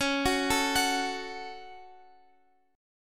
C sharp Suspended 4th Sharp 5th C sharp down Guitar Open F; arpeggiated